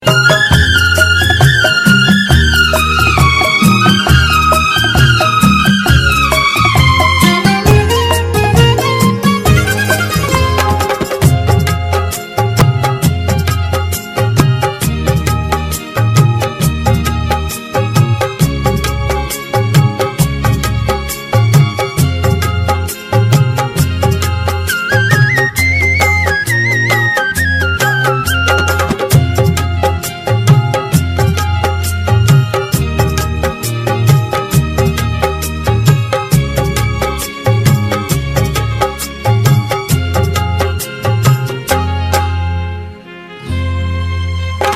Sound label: Karaoke